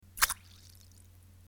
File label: emptying syringe in water fast